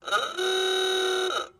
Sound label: Car Horns